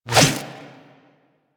Electro Prod Whoosh; Warframe; Weapons

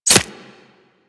Arrow Impact Flesh; Warframe; Weapons; Player

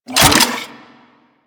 Torpedo Pistol Reload, weapons; Grn Torpedo Pistol

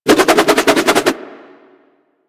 Nunchaku Quick Spin Whoosh; Warframe; Tenno

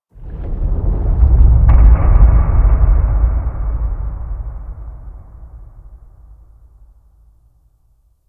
Cave Underwater Rattle; Ambience; Warframe; Stalker Cave

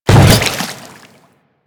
Hammer Boss Step Water, Footsteps; Warframe; Grineer

